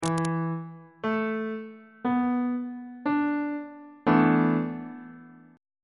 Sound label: d double sharp dominant 7th suspended 4th chord on bass clef